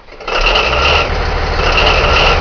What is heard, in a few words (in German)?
Anlassen